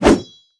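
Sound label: Attack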